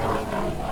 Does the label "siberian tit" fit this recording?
No